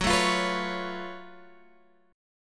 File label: Gb Minor Major 11th Gb Guitar Open G; down; chord shape